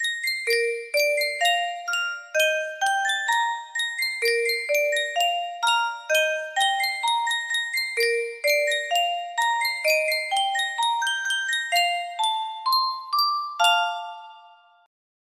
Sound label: yunsheng music box, music box melody